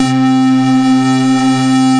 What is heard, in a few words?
Synthesizer Middle C Notes, Lead; SYNTH KEYS ETCETERA